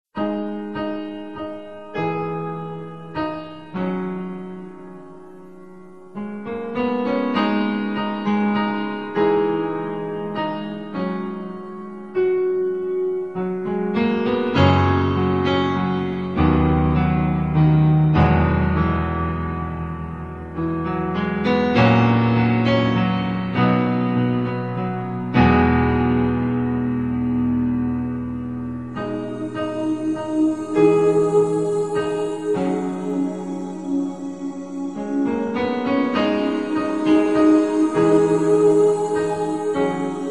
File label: acoustic piano alone